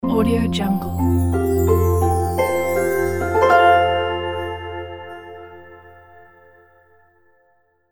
elegant harp logo; barnabysmith

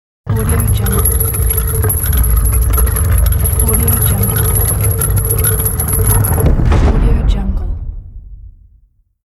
castle door with chain

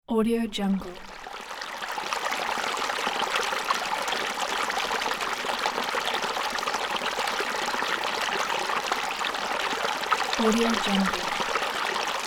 water flow

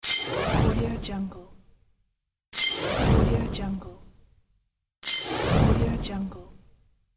magical flare; creatorium sound design

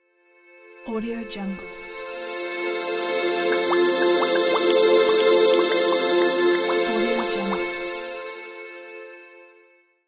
light moment logo

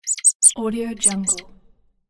small rabbit voice

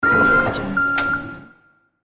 forklift loading

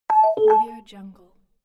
level completed

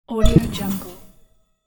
neutral tone postive glitch achievement

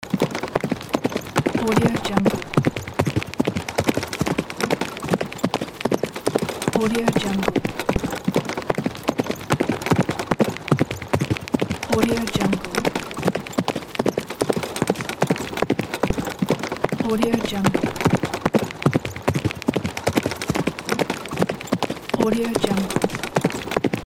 horse gallop

